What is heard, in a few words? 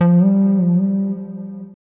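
synth bass, ambient, 160, C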